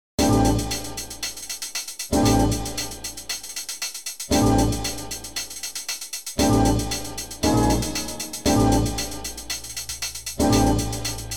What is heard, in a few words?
Instrumental; Toxic dust